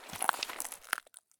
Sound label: prone glass; Escape from Tarkov